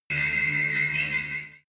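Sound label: extrinsic chirp, energy; energie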